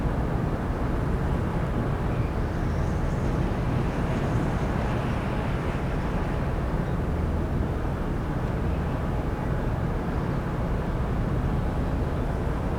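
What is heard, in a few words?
Ambience Wind; Pilot Down Behind Enemy Lines; CHAPTER4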